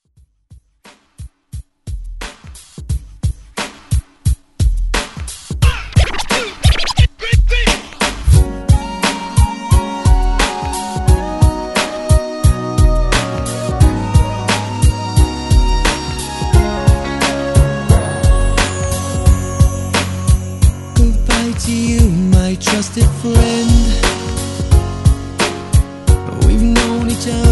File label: 90s Pop Redrum